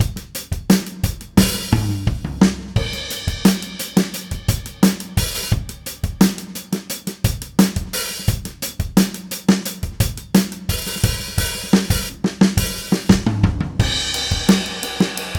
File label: Basic Ludwig Preset (60s)